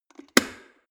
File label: plastic water boiler close lid fast